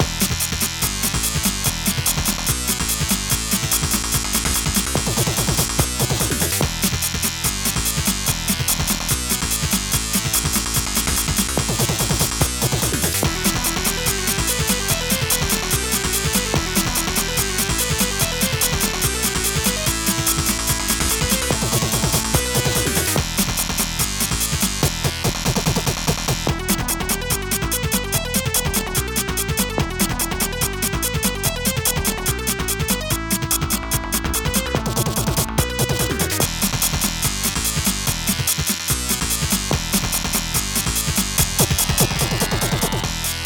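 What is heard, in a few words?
Retro Rock Theme; Akinofa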